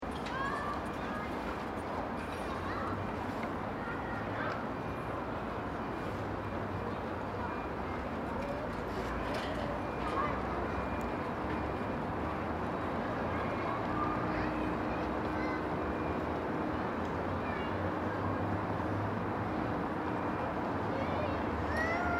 Kindergarden Traffic, Kindergarden, playground, in city centre; Semi-distant with unrecognizable language. Occasional kids shout.; Traffic And Car Sound Effects; Lynne Music